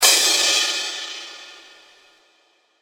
Crash, impact samples

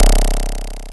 blips, opl3

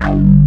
PANNER BASS, Synthsonic; Pro-Rec - Synthsonic; BASSES

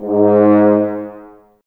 G#, Brass, F.Horns, Woodwind Brass Ensemble

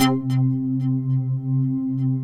BIG UPPS, SYNTHS; Pro-Rec - Synthsonic

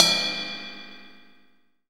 FUNK RIDE, VINTAGE FUNK; Zero-G; Funky Elements

